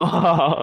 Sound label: Laugh